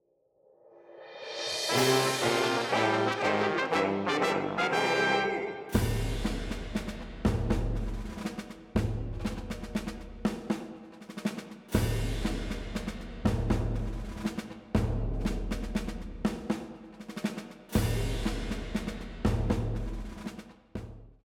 BGM Compass; PAPER MARIO Color Splash; wiiu

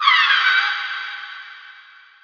Call; RPG Maker; Legend of Heroen v1.20

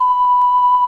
ocarina; RPG Maker; Legend of Heroen v1.20

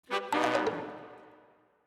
Disappointed; PAPER MARIO Color Splash; wiiu

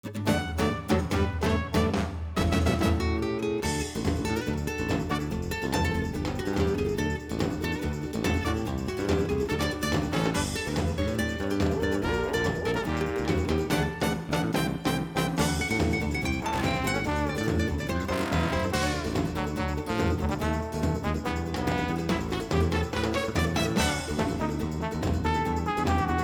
BGM Festival; PAPER MARIO Color Splash; wiiu

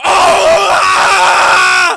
death; RPG Maker; Wartools v1.14

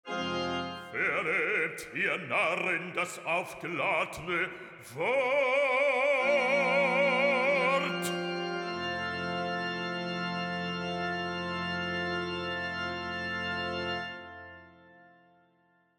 Battery; PAPER MARIO Color Splash; wiiu

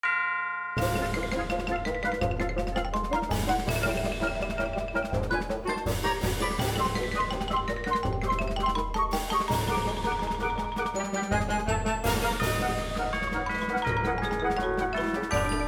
BGM Cooking; PAPER MARIO Color Splash; wiiu

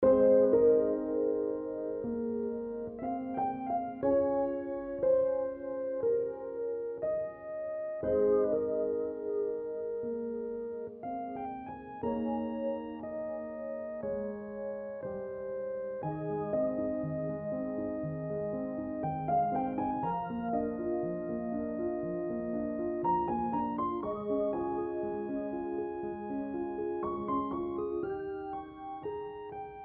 Piano; RPG Maker; Grimm's Hollow

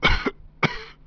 Cough; RPG Maker; Legend of Heroen v1.20

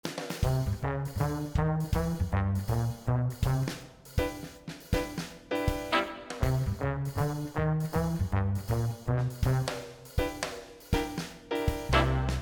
BGM Quiz Comment; PAPER MARIO Color Splash; wiiu

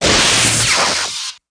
spell lightning storm hit; RPG Maker; Legend of Heroen v1.20

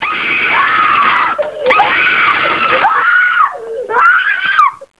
Screaming long; RPG Maker; Wartools v1.14

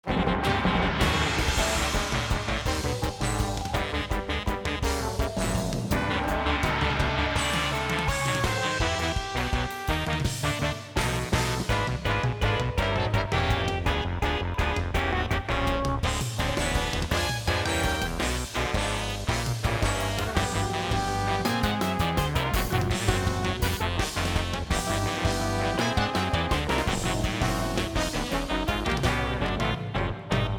BGM; PAPER MARIO Color Splash; wiiu

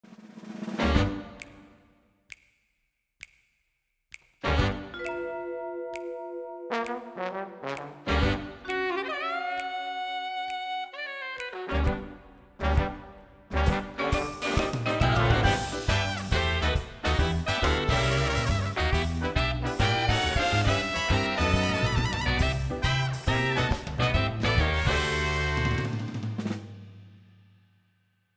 Musical; PAPER MARIO Color Splash; wiiu

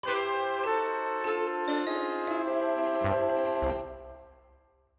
Game Over; PAPER MARIO Color Splash; wiiu

